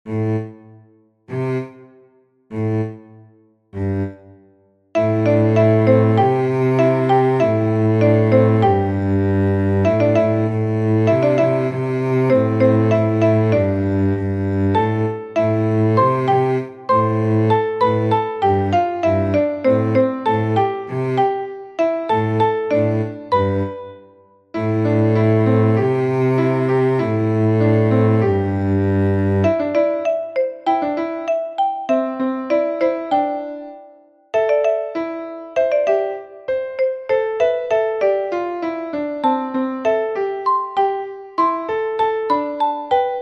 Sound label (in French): Instrumental à chanter; Canon Des Arbres